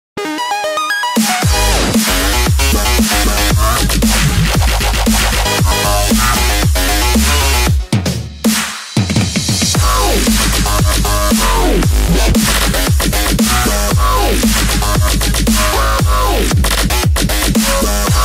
Two Step

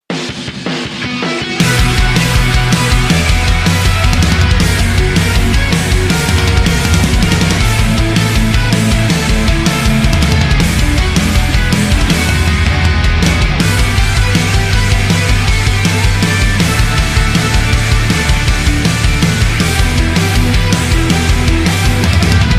Rock

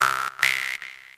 Cute Txt

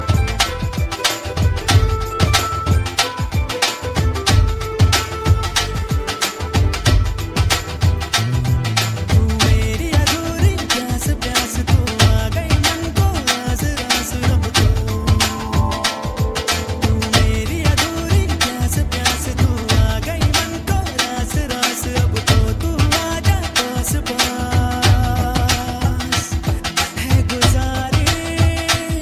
Dhol Mix

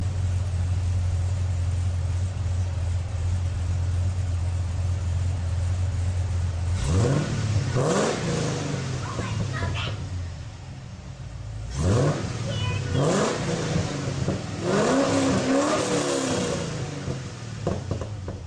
Camaro Ss

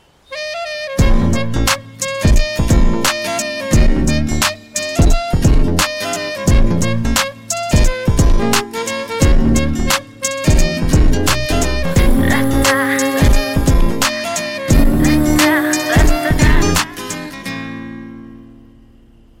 intro BGM

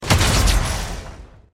Shazam lightning

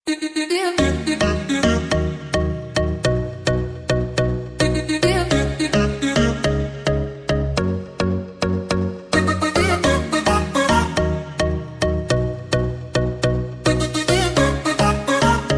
Hook up music

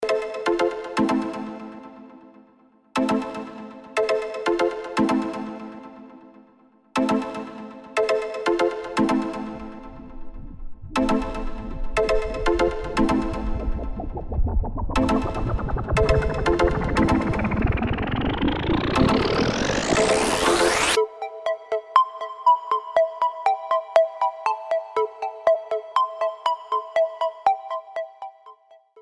broken sound

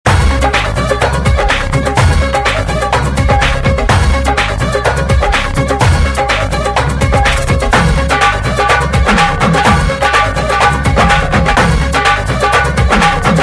Bhangra Beat

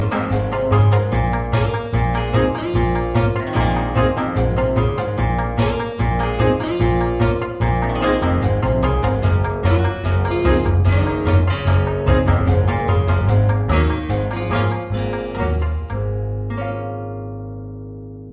Guitar Sound

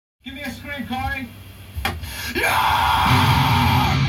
Scream; Corey Taylor

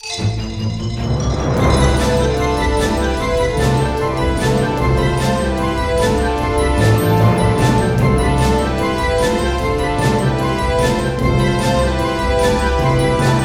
Orchestra; Green Hill

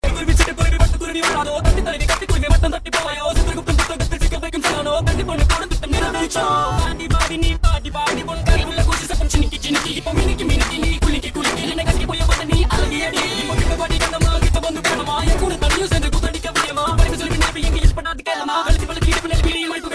Remix Rap

